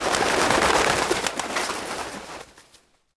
Birds Scatter; Sid Meier's Civilization 4 Complete; Warlords

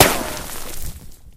ric, weapons; Left 4 Dead 2

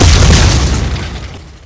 explode; Ace of Spades

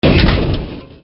wood hit; AssaultCube; Alien Stars; American Muscle Series

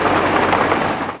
move order vehicle; Hearts of Iron IV Death or Dishonor

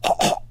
choke, survivor voice; Left 4 Dead 2

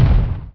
wall thud; Emperor - Battle for Dune

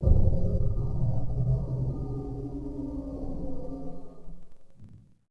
Pound Growl; Ambient; Sid Meier's Civilization 4 Complete; Beyond the Sword

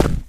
charger run right, footsteps; Left 4 Dead 2

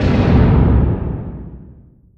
distance ship hit small effect; Hearts of Iron IV Death or Dishonor

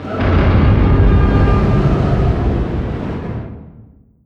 distance super heavy battleship damaged defend; Hearts of Iron IV Death or Dishonor

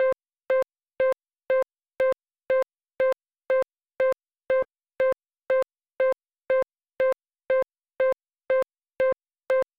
10 sec half beeps

